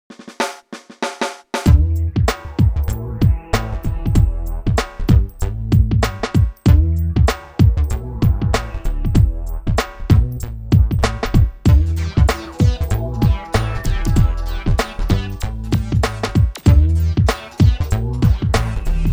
technics sx-wsa1r, dance contemporary dance

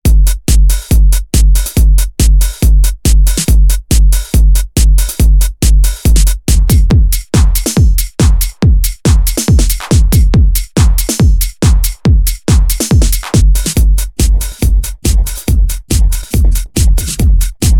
filter loopmorph; prosoniq morph vst

